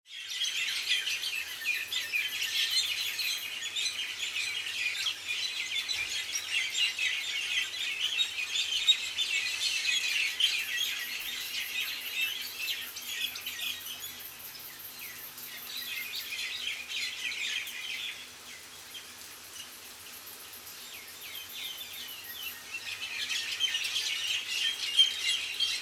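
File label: birds countryside mauritius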